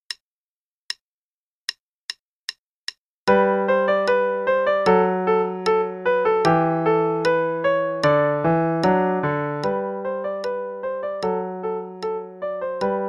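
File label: Play-a-long, Dance, half note=76; Little Dance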